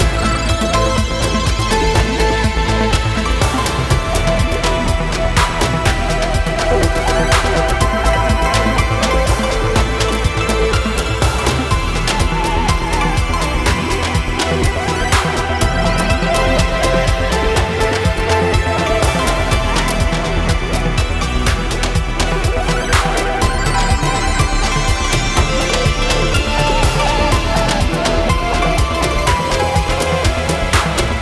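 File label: free spins starbar loop; netent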